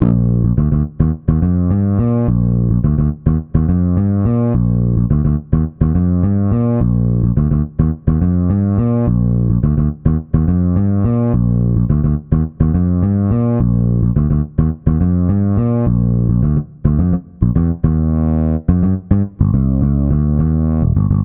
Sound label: Bass; Chicken